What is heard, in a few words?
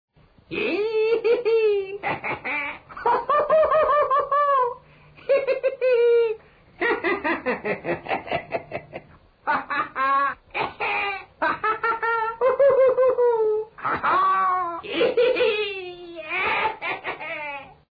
Evil Laughing